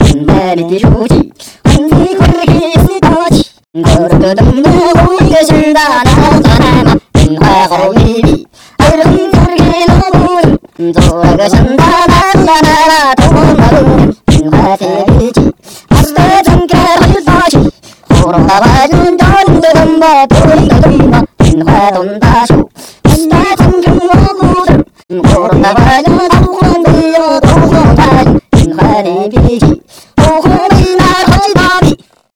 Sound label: 5 djembe